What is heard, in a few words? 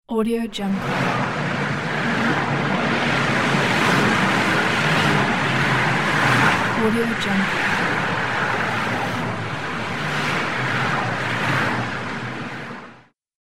traffic